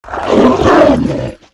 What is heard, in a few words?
Barghast Attack